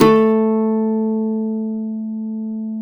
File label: CUATRO A 3, Guitars; Spectrasonics; Hans Zimmer Guitars VOL-2